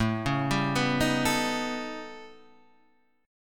A 9th Flat 5th Guitar Half Step Down, chord; A9b5

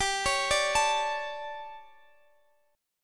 D Major 7th Suspended 4th G

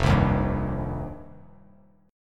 F 7th Suspended 2nd; F7sus2; strummed; JGuitar

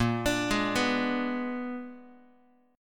A sharp 7th Flat 9th A sharp down Guitar; A#7b9 arpeggiated; JGuitar

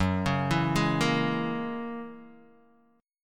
F sharp 9th, Guitar DADGAD

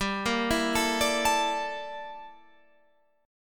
G Minor 6th Add 9th, Guitar, Open F; Gm6add9; arpeggiated; 19,17,16,16,17,16

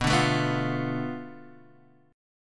B Minor 7th Flat 5th B Guitar DADGAD

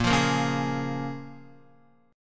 Bb Minor 7th, Guitar, Bbm7 chord; Bbm7 strummed

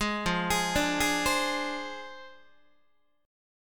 F Minor 6th F down Guitar; Fm6; arpeggiated; JGuitar

